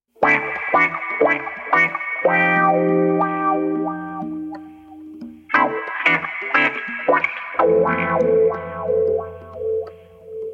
In The Pocket Guitar Wet (Stem)

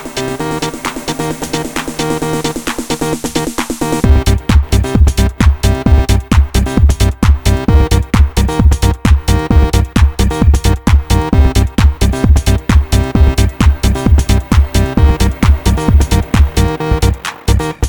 Trance

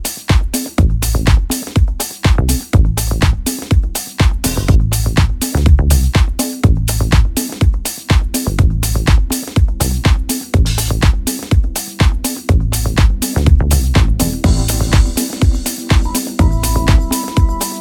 Organ Ride

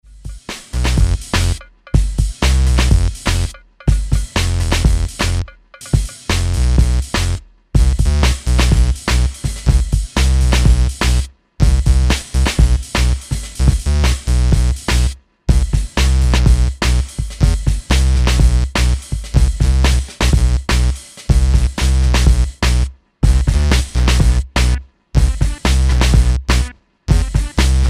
instrumental

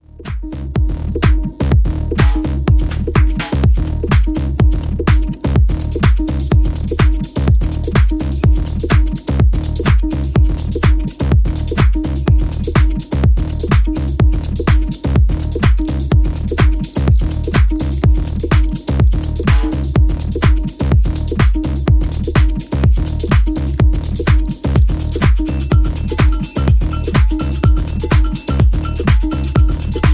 triggers drum

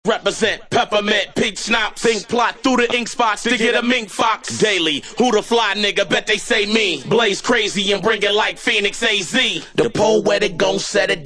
acapella

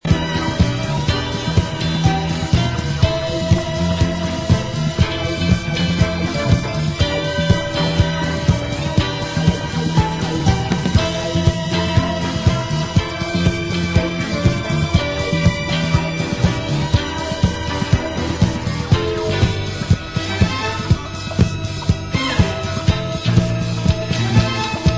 vocal; Disco

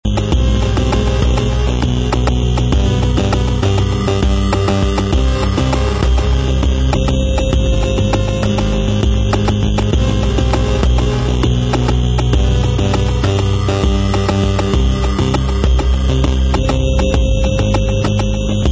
8bit

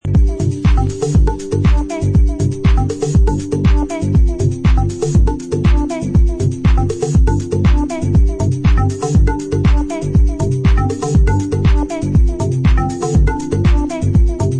instrumental dub; Ostgut Ton

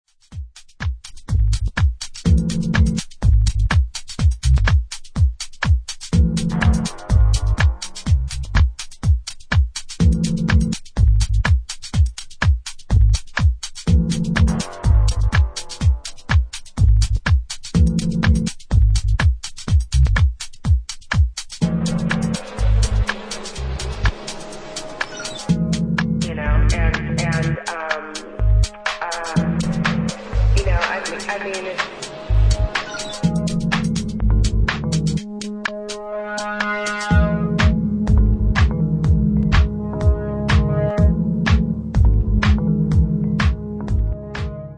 sad little piano

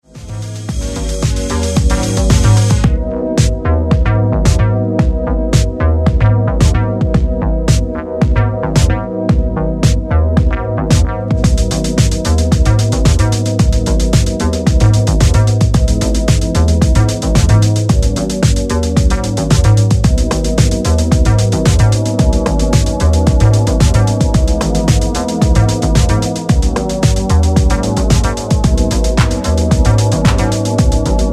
Instrumental

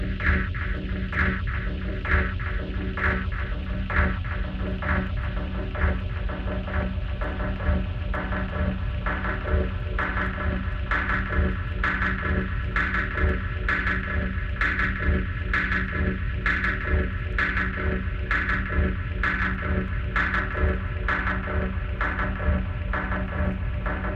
Street Dub (ambience); Figure

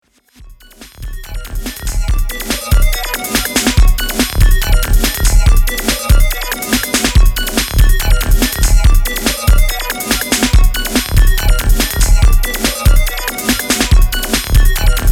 Instrumental

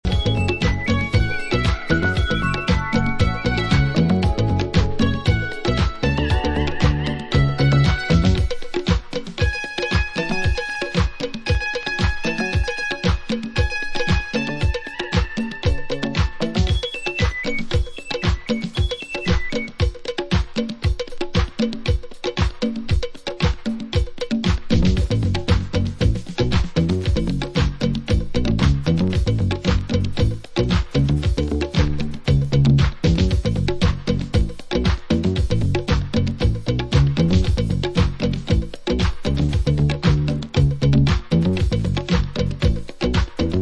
Instrumental; Disco

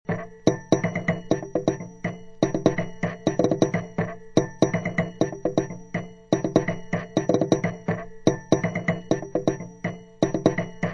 electric sawblade gamelan sounds

